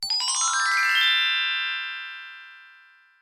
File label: shine